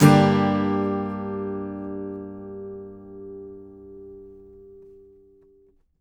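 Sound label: acoustic guitar G minor down, right; Country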